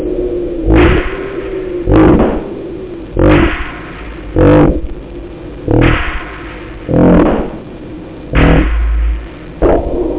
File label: vocal